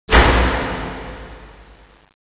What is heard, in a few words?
lights on